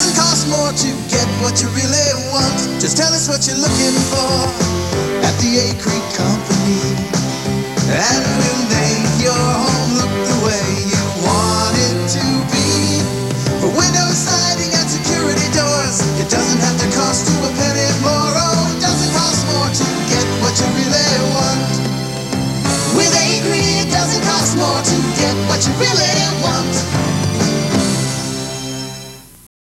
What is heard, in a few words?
jingle